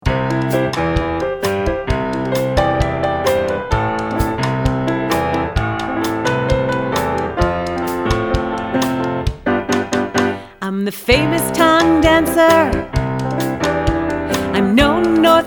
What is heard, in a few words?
tT Song Quiet Tongue Dancing Sound